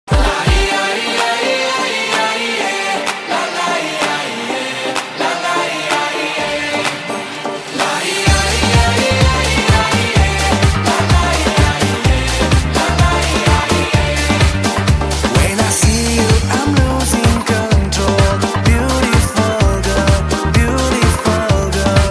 Musica Latino

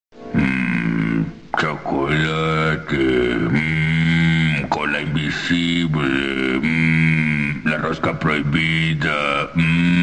Los Hmm; De Homero

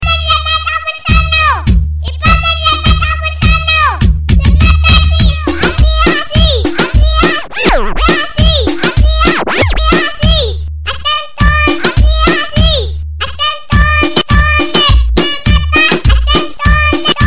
Nino Cantando

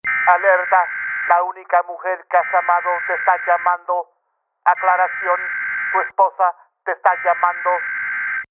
Alerta de Esposa

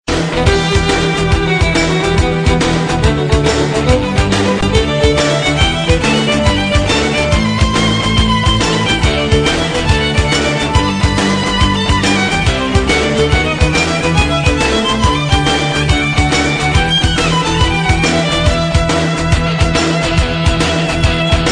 Danza

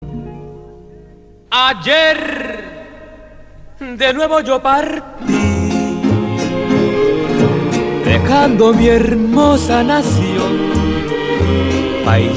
Mi Guitarra y mi Voz

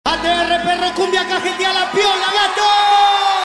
gato

